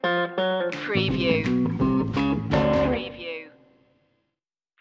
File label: fixin to score stinger; screwball comedy